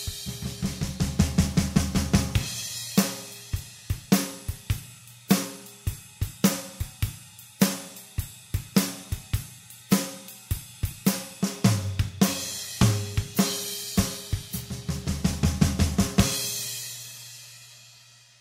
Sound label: stripper music